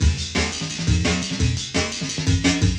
Flange FX, Loops, breaks; M8

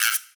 EQ Perc, Percussion Mix

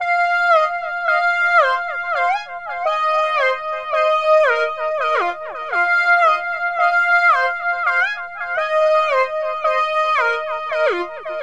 Drum & Bass

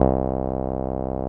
SIEL, String Machines

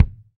BD, Roland; Sound & Vision - Gigapack I

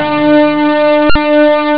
d50 voice; steel; Amiga Soundtracker Sample Packs

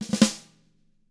RUFF, SNARE ROLLS; ILIO; Double Platinum Drums 2

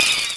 Fan Chant; Mario Paint

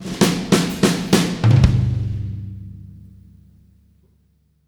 Fills 64; Zero-G; Monster Beats

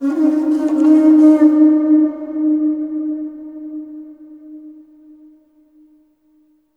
HRNPHR, Heart of Africa; AMB. HRN FX

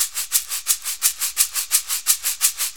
Shaker, Perc Loops; Drum Tools

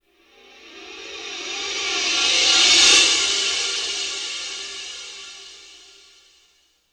cymbalize; OVER LONG; ILIO; Trance Fusion

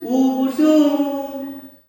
"UBOO-SHUUN", MALE; Spectrasonics; Heart of Africa 2; S.MALEAMB

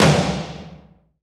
TOM, Dance

